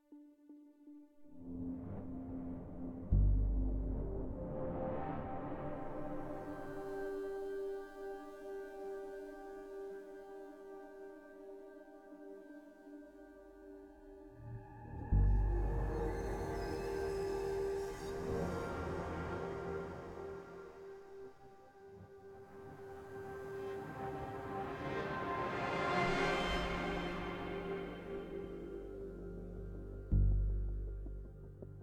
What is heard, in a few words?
Fight 160 Bpm; Sonar